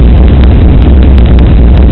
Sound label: gaspipe, ambience